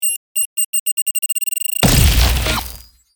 Sci fi plasma grenade futuristic time bomb explosion